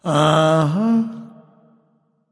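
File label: nonverbal agree; smite; voicepack; ullr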